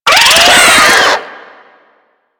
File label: Infested Biped Death; Warframe; Moa